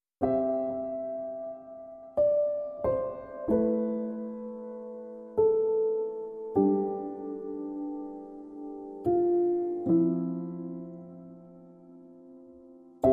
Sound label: Piano